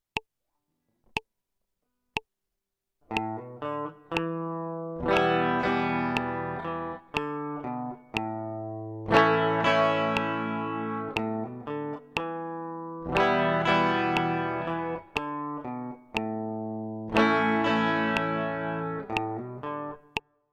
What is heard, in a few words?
intro melody em a